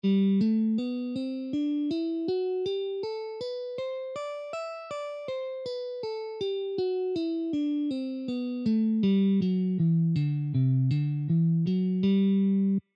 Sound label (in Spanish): escala de sol mayor posición 2